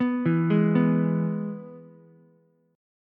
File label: E-Major E, Ukulele; arpeggiated; JGuitar